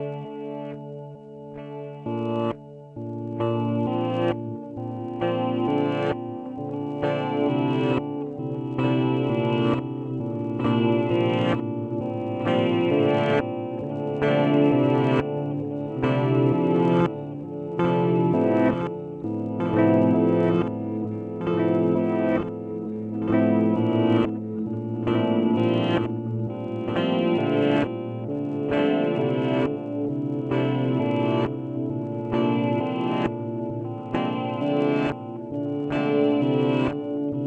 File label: Radical Delay Reverse; Alexander Pedals